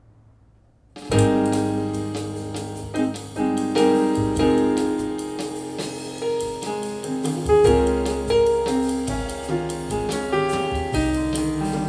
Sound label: jazz; SOULNOTE A-2